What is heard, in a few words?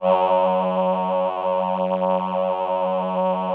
VP-330, String Machines